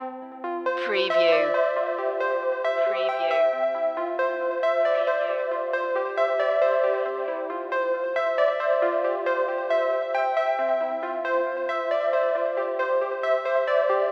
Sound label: tones of aqua loop